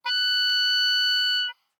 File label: Oboe ff Gb6